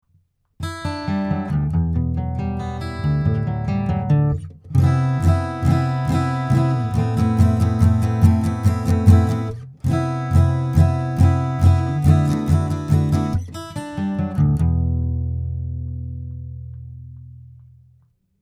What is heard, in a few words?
Stealth Acoustic